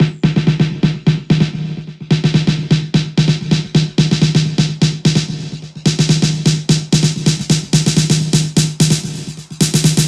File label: 128BPM Freeman, House Electro Fills; 16Bars; Vengeance Samples